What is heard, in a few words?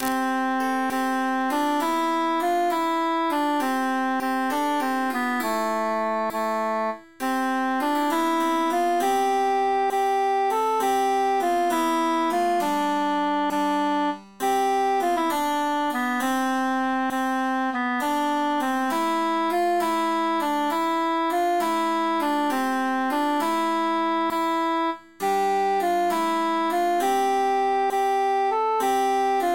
altus emphasized